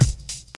Airy Kickdrum Sample C Key, Royality free bass drum single hit tuned to the C note.; 1990s